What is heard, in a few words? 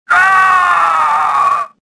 death; Star Wars Jedi Knight II Jedi Outcast; XBOX